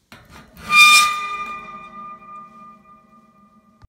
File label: scraping iron